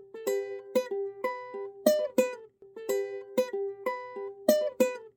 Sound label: soothing music; environment pollution